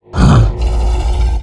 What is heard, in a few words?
Grrr Dmg; FlyForInsanity